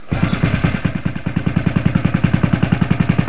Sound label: nautical